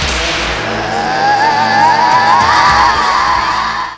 powerup; db mod; frieza

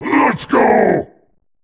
power up; db mod; omega

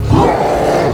nemesis pain, zombie; cstrike; zombie plague

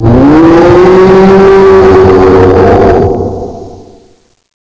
scene howling; fallen titan

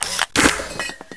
launch clip out, weapons; plaunch

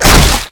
charger punch; cstrike; zombie plague

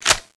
thanatos reload; thanatos5; cstrike

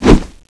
sword off slash; sfsword

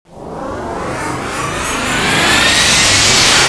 shoot hit; thanatos11

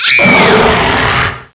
human death female; ZombieDarkness

